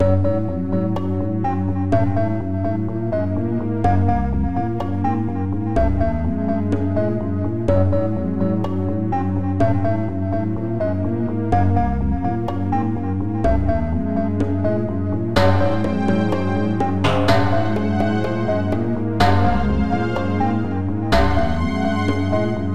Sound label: Solo Pentatonic, Ambient Pentatonic